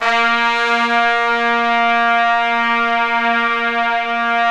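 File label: trumpets swell; Roland LCDP06 Brass Sections; Tpts mp)f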